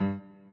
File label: chord in g